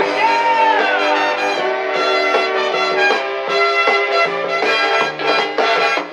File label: horns, phonky loops